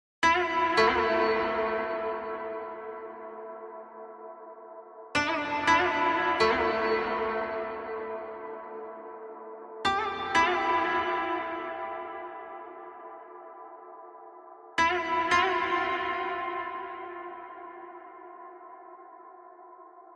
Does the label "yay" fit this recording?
No